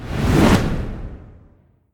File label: jump long; Nickelodeon - Destruction Truck Derby